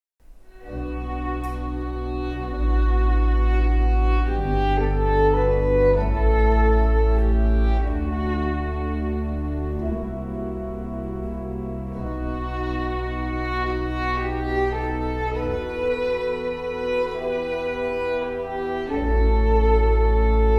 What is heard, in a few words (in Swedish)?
tonerna fiolin